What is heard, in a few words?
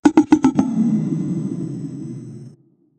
short retro rpg game sound